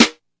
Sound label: classic hh Hol Snr2, Classic Hip Hop; STYLE KITS (Hip Hop, Etc); Classic Hip Hop Mega Kit